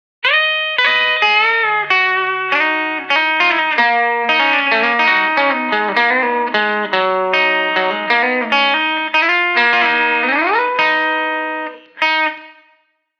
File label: guitar